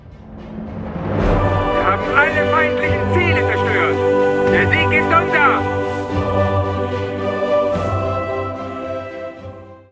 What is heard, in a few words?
victory; angriff offizier